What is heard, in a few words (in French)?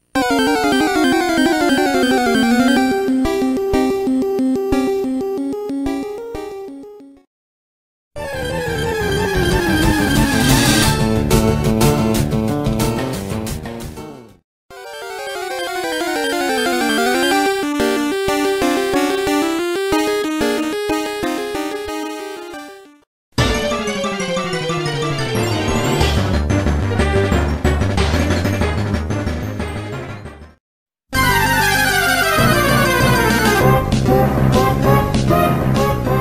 montage musiques sauvage